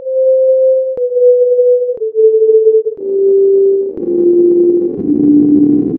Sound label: shiver; nyquist; pmorales